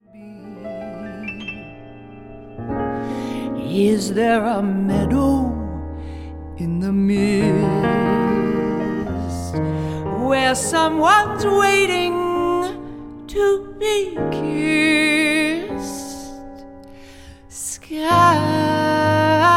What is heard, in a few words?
Jazz Aviary